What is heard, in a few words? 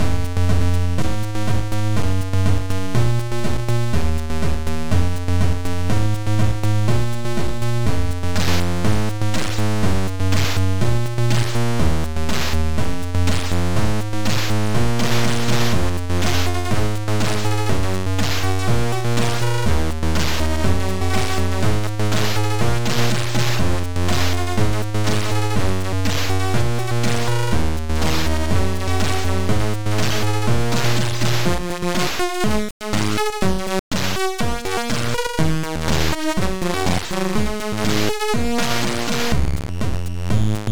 Techno